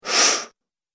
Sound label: Human Male Minor Pain Sound